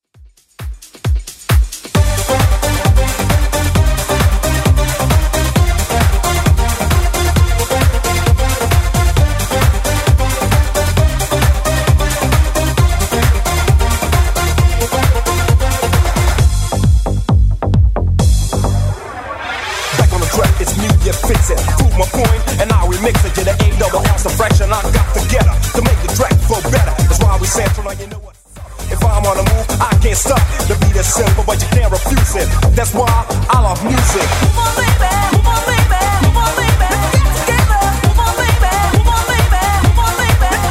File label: 90s Redrum 133bpm